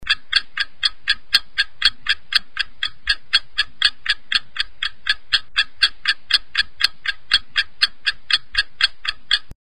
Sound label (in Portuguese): relogio tic tac